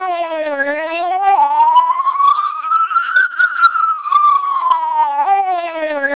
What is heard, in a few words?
siren, 600 bps TWELP; Vocoder; non-speech signals